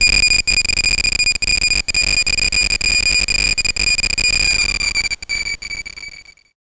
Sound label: FX SWEEP, SYNTHSWEEPS